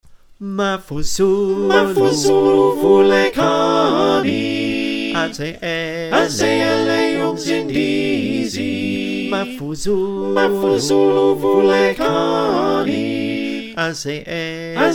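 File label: soprano alto tenor bass, choir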